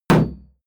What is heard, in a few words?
shield sheet impact dent